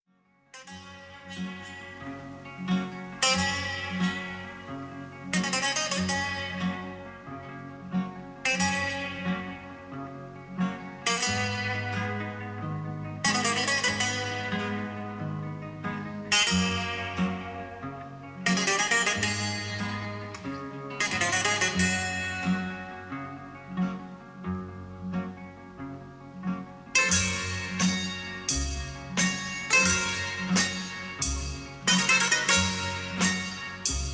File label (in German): Sirtaki langsam